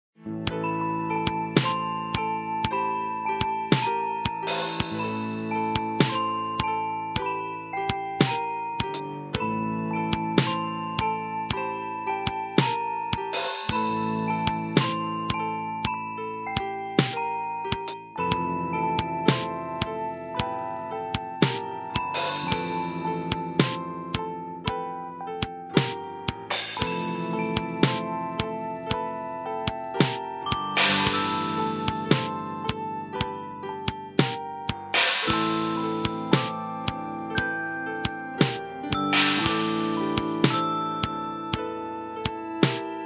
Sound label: caverns with drum, Music With Drum And Crystal; caverns2; Telos